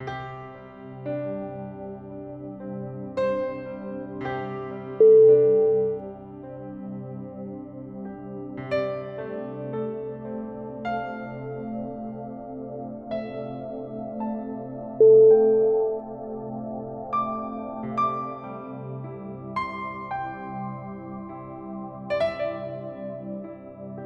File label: meditative atmosphere